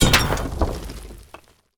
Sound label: ballista hit building; ships siege